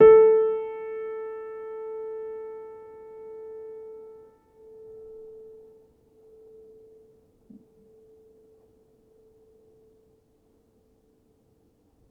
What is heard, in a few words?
Upright Piano; HSS OP Pack; healing soundscapes